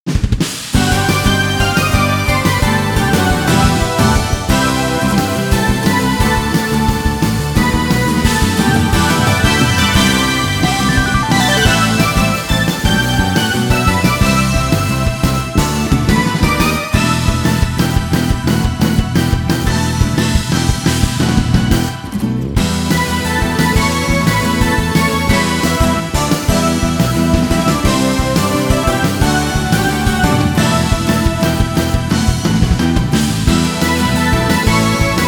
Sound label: Updraft (Synthpop Ver.); atelier shallie recollection archives